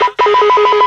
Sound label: Wheel Up Signal; Jungle; Blu Mar Ten - Jungle Jungle - 1989 to 1999 Samplepack